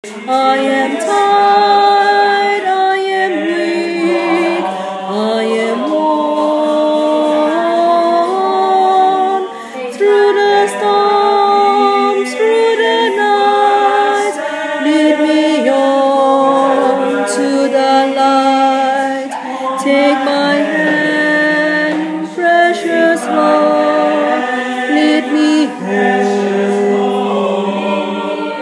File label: bass